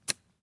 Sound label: golf club chipshot